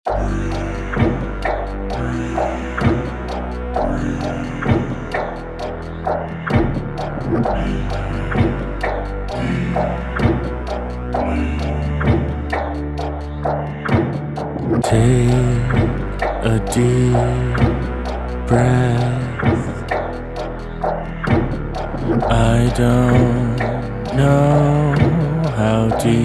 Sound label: breathe; weeklybeats 2024